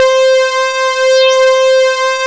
ALLROUND C4, LEAD SYNTH; Best Service Alpha Dance vol.2

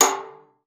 PIZZ, VIOLIN; Xsample VOL-5; 90 s Sample CDs; VIOLIN FING

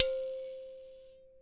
C4 M BIRA; AKAI S6000; WorldSounds

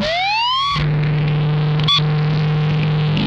CLANGERS, Funk Guitar; 90 s Sample CDs; Zero G

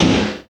SNR INDUS, snares; Roland L-CD701; Snares 5; Sn Modules 5

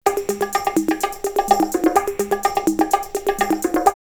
Bongo 126, drumloops OldSchool; X-Static Goldmine; 90 s Sample CDs

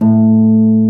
Harp A1; Mo' Phatt; Digital Sound Factory Mo' Phatt

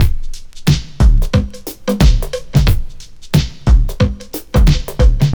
TRIP 90, trip loop

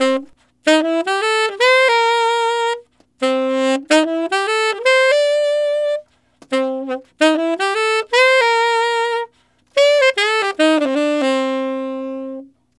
Tenor Shuffle in C7, Tenor Sax Loops, Jazz; Sonic Foundry (Sony Creative Software); Jazz Solos and Sections

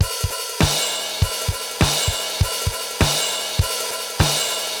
RX17 (100BPM), cyborg beats; musicradar

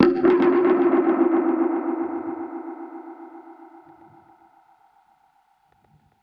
Perc Hit, dub percussion one shots; musicradar

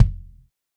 DW Red Maple Kick; Sonic Foundry (Sony Creative Software); Vital Drums

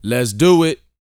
VOX, R&B Dance, HIP-HOP

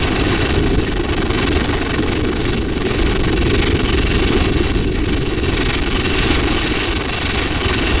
Drone Ambient; Drums & Drones

